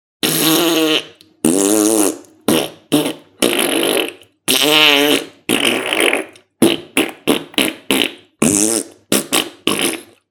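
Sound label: fart sound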